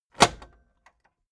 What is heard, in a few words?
tape deck close heavy